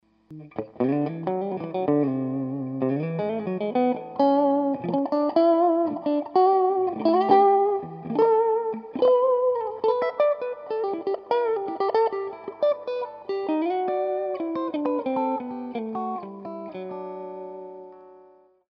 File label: walnut middle bridge pickup